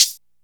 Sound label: Clear Closed High Hat Sound E Key, Royality free closed high hat sound tuned to the E note